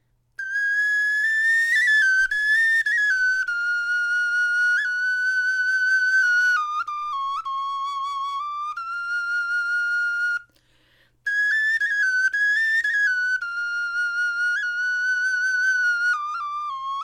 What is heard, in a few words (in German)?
melodie